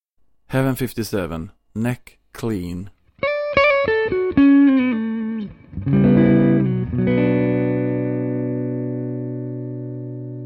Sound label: Heaven 57 Neck